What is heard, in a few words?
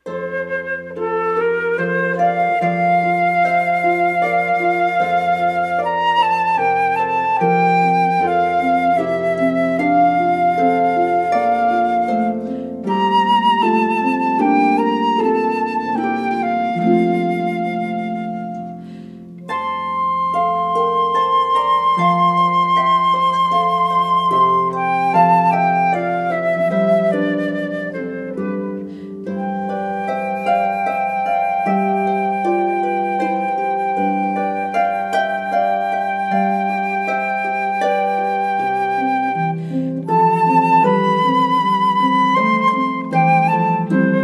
with flutist, Harp